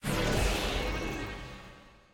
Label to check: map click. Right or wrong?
Right